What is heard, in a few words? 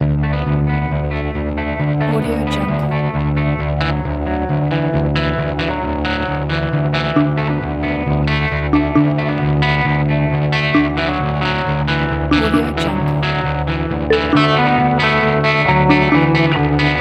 folk corporate